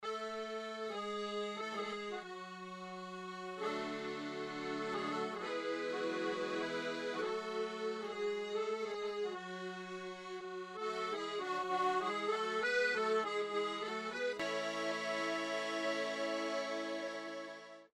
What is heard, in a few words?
accordian ski